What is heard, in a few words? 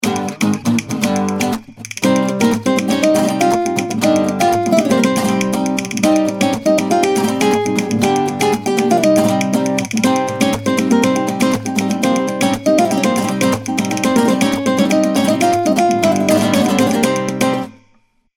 scale